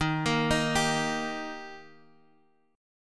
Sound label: Eb Major, Guitar Half Step Down